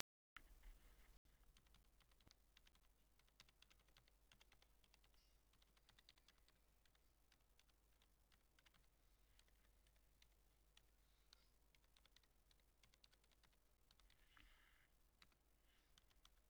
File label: Keyboard Recording; Ducky One 3 Mini Daybreak